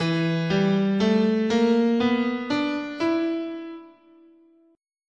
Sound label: E Blues, guitar, scale